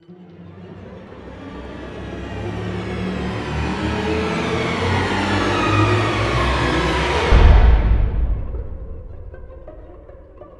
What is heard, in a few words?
Transition; Cinematic Trailer Music; BlueFX; Bonus - FX & Transitions